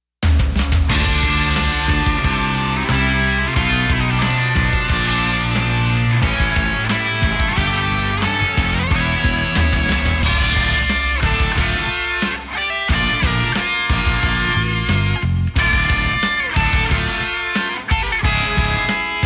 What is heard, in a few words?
Match Loss; mario strikers football battle league; Against - Waluigi